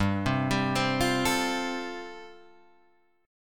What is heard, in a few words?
G 7th Suspended 2nd Suspended 4th; G7sus2sus4 arpeggiated